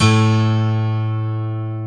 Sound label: 12 STRING A, Twelve String Guitar